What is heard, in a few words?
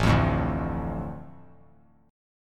A Suspended 4th Sharp 5th; Asus4#5 strummed; JGuitar